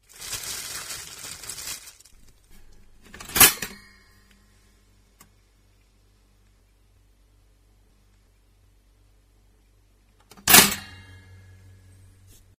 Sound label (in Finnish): paahdin